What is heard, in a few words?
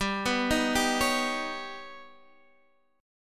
Eb Augmented 7th